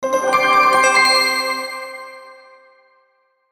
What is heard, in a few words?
Logo Bells Reverb; Sonic Logo; Blastwave; Sonic Logos; Production Element Imaging Element Accent Transition